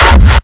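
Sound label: Raw Kick, Hardstyle; Coldpoint; Kick Compact Pack Vol.1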